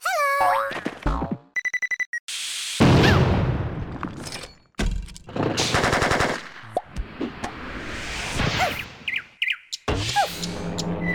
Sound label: ambience sfx